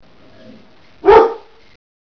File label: woof